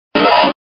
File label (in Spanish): Grito de Bagon